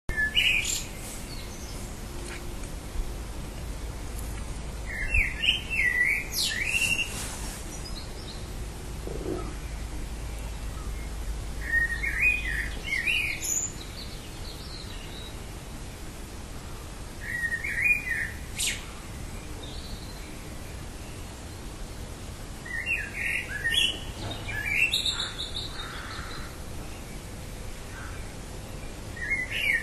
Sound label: blackbird singing